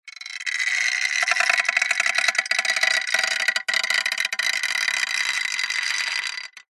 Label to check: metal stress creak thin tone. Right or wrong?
Right